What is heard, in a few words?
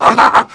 heavy laugh short